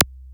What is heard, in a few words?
TECHNO PERCS; Crypton - DrumBase5000